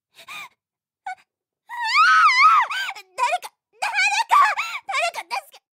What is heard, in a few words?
scared anime girl sound effect